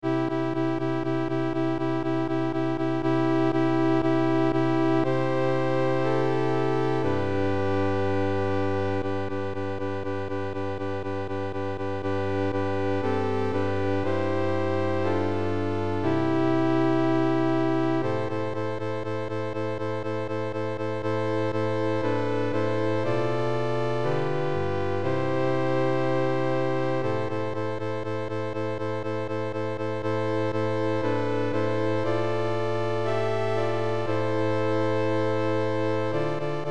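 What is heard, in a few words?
Augmented Litany, mixed; Sugubaya ekteniya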